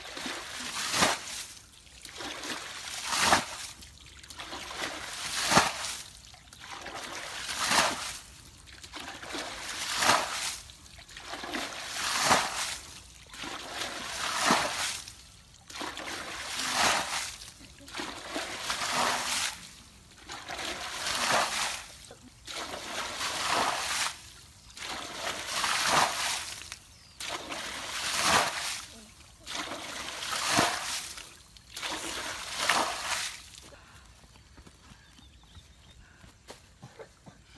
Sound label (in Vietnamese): tieng tat nuoc ngay xua